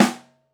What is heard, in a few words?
Snare Mono